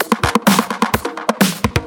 Straight Loop 128 BPM, House

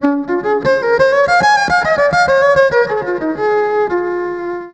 EVIOLIN, ELECT VIOLIN; Best Service; World Colours

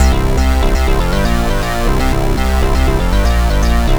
Hoppin Metal Bass; Sonic Foundry (Sony Creative Software); The Electronic Music Manuscript Collection; Bass Foundations

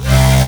Basses Root C

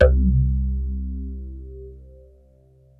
BASS, MORPHEUS; Syntec; Wall of Sounds

